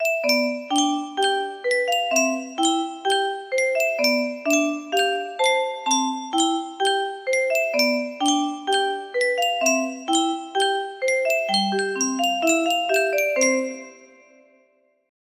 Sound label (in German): yunsheng spieluhr